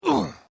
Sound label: Pain Umph, Male Painful Grunt, Hurt